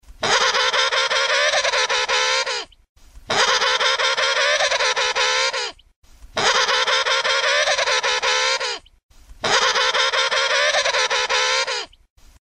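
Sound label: penguin funny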